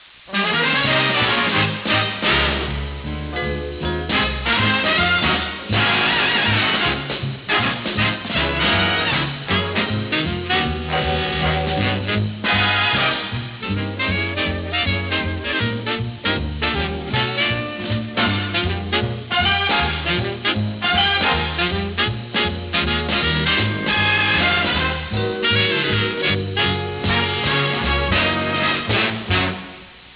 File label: instrumental